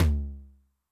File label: Noise Low, 909 LOW TOM